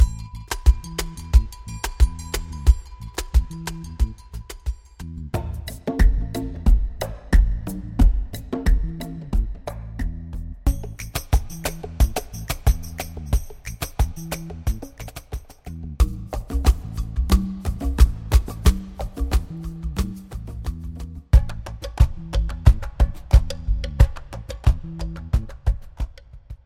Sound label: percussion loop